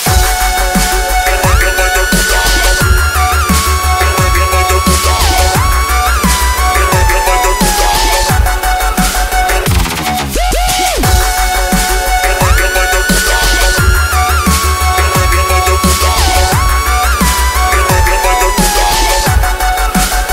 Dubstep Tone

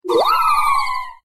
Leafeon cry

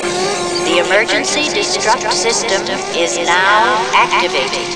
Alien Alarm

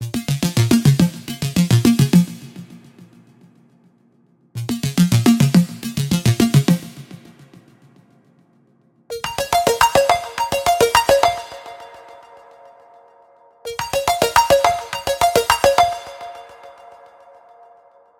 Minimal

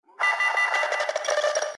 Bleep

